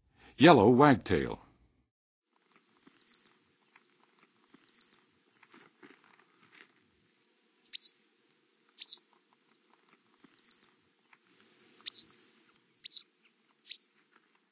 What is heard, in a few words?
Yellow Wagtail, Songs of Birds; North America; Western USA; Western USA Songbirds